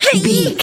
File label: voice female attack